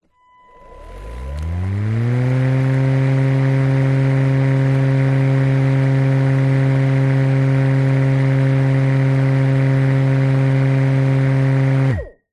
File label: household mower electric on run static off close up